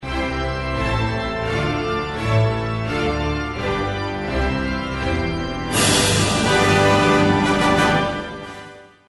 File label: saint saens symphony 3 organ; Symphony No. 3 (Organ): II; 1886; reverent